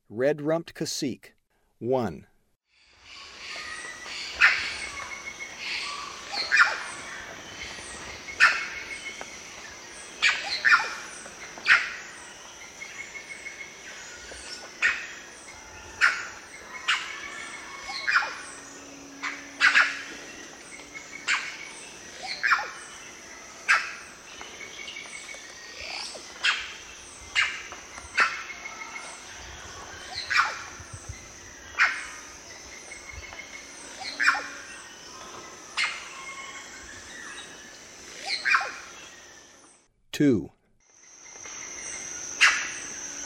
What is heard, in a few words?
Red-rumped Cacique; S. America; Eastern Lowlands